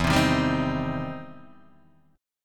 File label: E Minor Major 7th Sharp 5th, E, down, Guitar, Open D